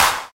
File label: clap D key